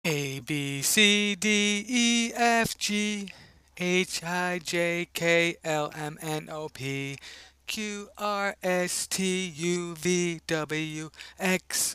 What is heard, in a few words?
alphabet song